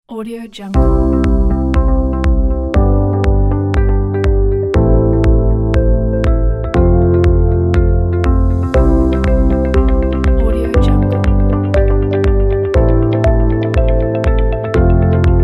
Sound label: corporate innovation; tastyaudio